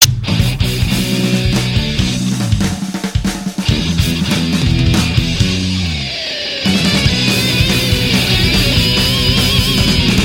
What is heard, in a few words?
metal theme; rock